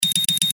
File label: signal